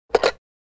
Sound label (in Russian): phone